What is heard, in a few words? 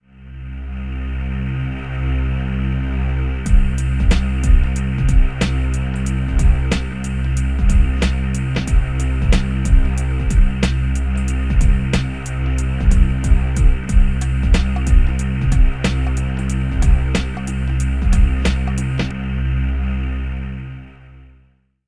low perc, ambient